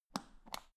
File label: power button turn on off